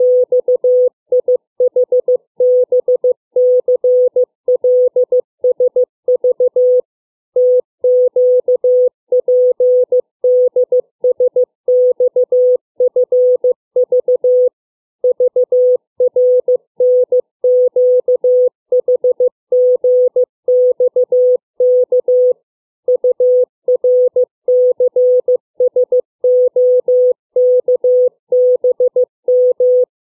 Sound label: letter number groups, CW